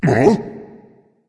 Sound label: surprised; cupid